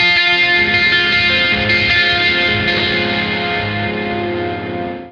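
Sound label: Lake Hi Gtr F minor; torchlight iii; ps4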